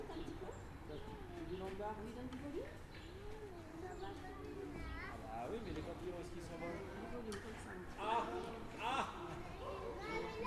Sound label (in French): enfants melodie